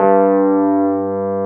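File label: Wurlitzer G1 - R; USB Soundscan vol.09; AKAI; Keyboards Old School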